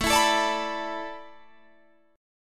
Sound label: A Suspended 2nd Suspended 4th down Guitar Drop D